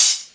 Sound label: Cymbals HH Open; Vengeance Samples